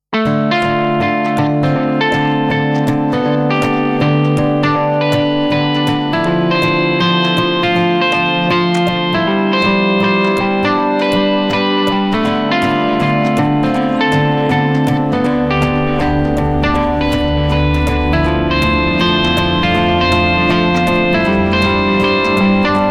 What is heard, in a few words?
Twinkle, phonetones; Nokia; Lumia 1020